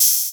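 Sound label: CR8000 Hat Open, Roland CR-8000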